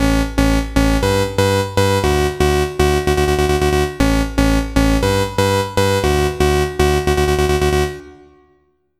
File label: Trance